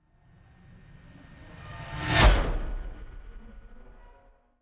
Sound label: fly by hover car hum high